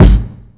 old school treble rich kick drum single shot g sharp key